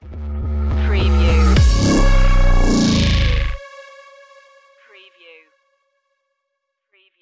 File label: bass tricks stinger